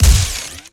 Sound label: Sci Fi Shotgun Fire Heavy; GUNTech; AlientAttack.MonoGame